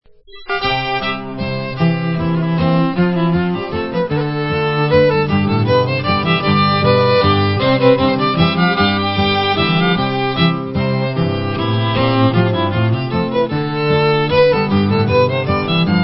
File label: Swedish Waltz, fiddle